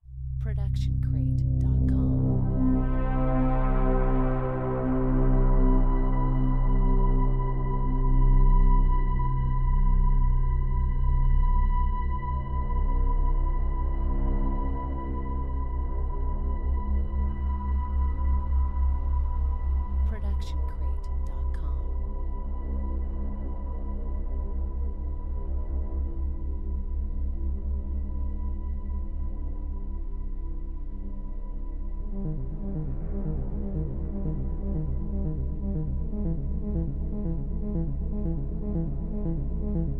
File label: 80s synth vibes; SoundsCrate